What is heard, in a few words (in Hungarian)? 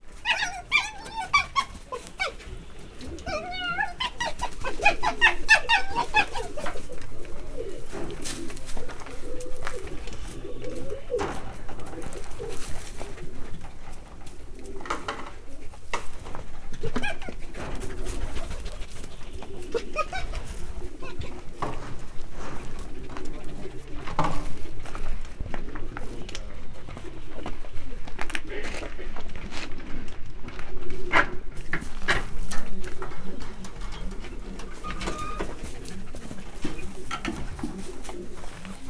ketrecben szaladgál, vörös róka; állathangok; miskolci zoo 2009